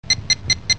Fast Beeps

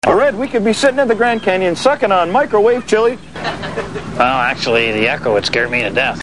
Echo; RedGreen

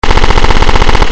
gun shots; Weapons

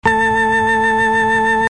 Electric Sound; Music

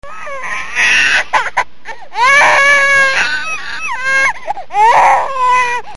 Baby, people

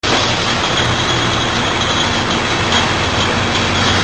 Bottling Plant, machines

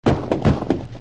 Shotgun Blasts; Weapons

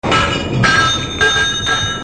Blacksmith

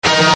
Chime; Music

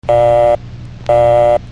Busy Signal

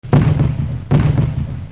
Anti-Aircraft Guns; Weapons

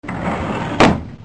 File Drawer Close

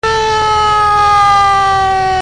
Police Siren; Machines

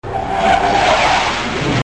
F-100 Fly By; Machines

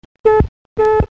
Car Horn

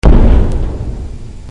boing

